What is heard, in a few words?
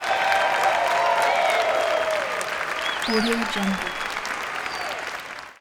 crowds theater applause theatre 500 people cheerful; تشویق جمعیت در سالن تئ; human sounds